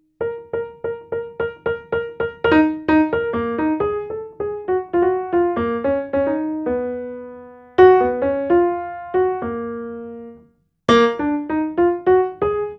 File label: Piano Solo, Cinematic Funk; Sony Creative Software; Urban Tone Poems; Master Zorn